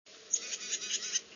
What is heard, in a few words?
titmouse dzat, tufted titmouse; birdsongs